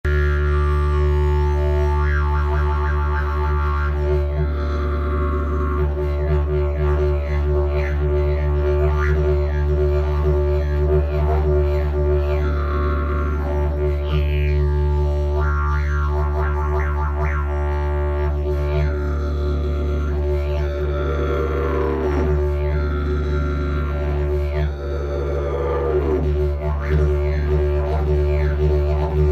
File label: jilm splynuti, didgeridoo; 32 mm; Tomáš Dufek